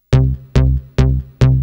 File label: Bass; Techno Music Maker